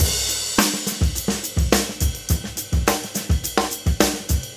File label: NuJazz Drums, Jazz; Digidesign; Pro Tools Essential Demos; Essential Loop Demos; Demo Session - Jazz